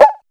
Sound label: drum, d#2, YAMAHA R5 RX7, DRUMCOMPUTER; Retro Sampler